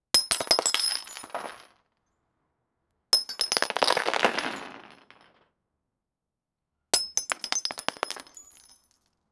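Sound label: heavy solid objects falling, crash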